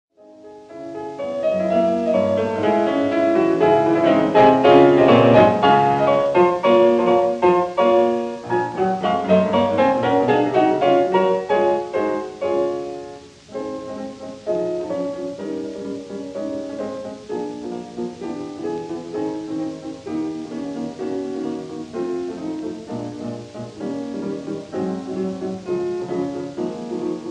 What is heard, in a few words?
Symphonic Etudes for piano, Finale, Allegro brillant; Schumann; Op. 13; Allegro brillante